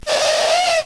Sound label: Worm Dead